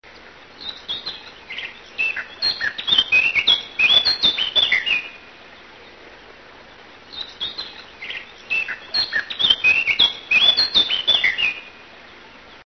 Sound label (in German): moenchsgrasmuecke; singvoegel